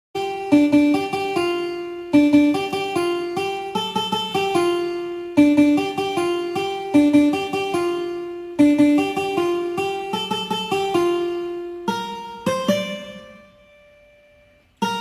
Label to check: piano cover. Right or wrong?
Right